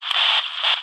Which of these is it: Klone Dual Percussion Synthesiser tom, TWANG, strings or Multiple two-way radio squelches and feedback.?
Multiple two-way radio squelches and feedback.